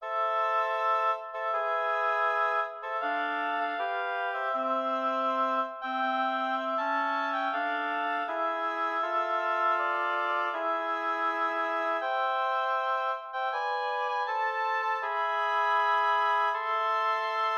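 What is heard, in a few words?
sonata double reed; Corelli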